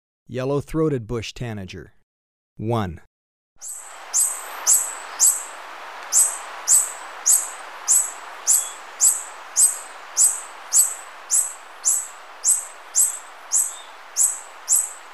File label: Yellow-Throated Bush-Tanager, Birds of Ecuador; S. America